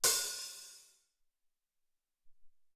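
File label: Open Hat, Alesis SR-18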